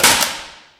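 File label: physics clipboard impact large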